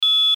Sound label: BEEP BUZZ HIGH; Multimedia; Beeps